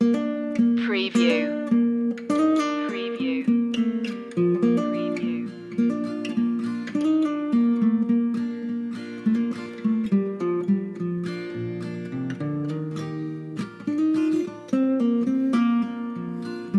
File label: barn raising loop